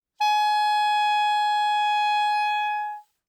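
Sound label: Soprano Saxophone non vibrato ff Ab4; Woodwinds; Bb Soprano Saxophone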